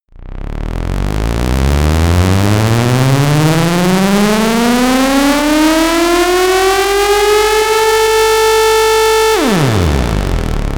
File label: bass synth siren